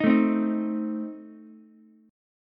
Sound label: A Diminished A 8,9,8,6 down Ukulele; Adim strummed; JGuitar